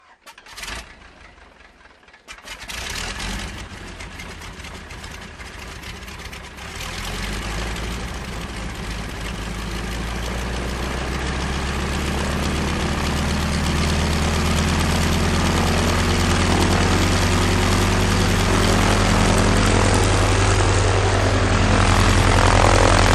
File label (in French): Bruits d avions